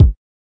kik

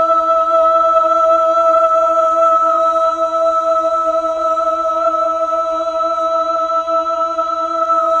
Fem E3, Mellotron Female Choir

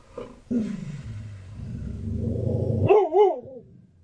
barking dog good; Zoo and Nature

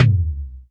Pearl SC-40 Kicks

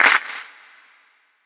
clap

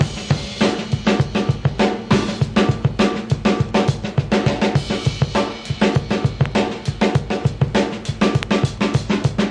crunchy rock, 101 bpm, drum loop; 101 to 110 bpm

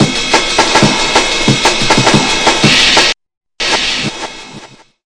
noisy, DRUM LOOPS and BREAKS, 183 bpm

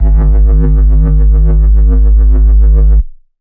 synbass oscillating; Synth Bass

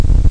engine, video games; tankbatt

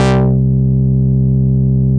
Synthesizer Middle C Notes, Lead

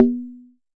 Jomox MBrane, drum machines; Jomox MBrane 11

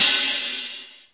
hi-hat open, Aggressive Electronic Kit; STYLE KITS (Hip Hop, Etc)

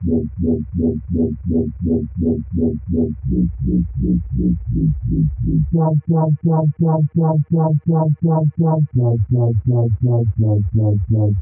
168 wubble bassline

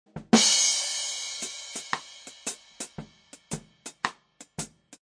hat mic, Drums

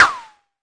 mbrute sn, Arturia MiniBrute; DRUM MACHINES

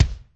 BASS, Alesis HR16 drum machine; DRUM MACHINES

